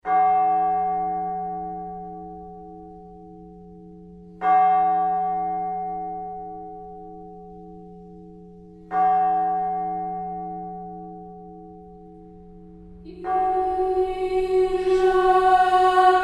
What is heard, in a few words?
children choir